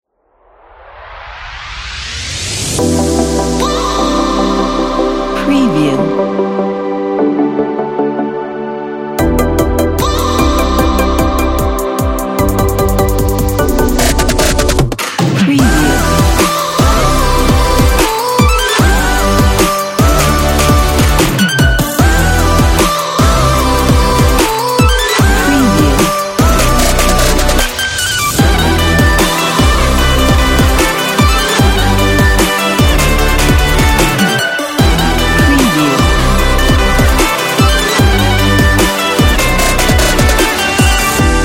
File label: inspirational future bass